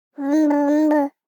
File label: BABIES TALK Boy 12 months Humbumbum; Baby Boom